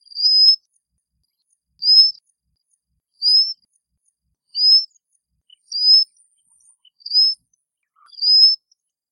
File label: Common Sandpiper calls Malim Nawar Perak Malaysia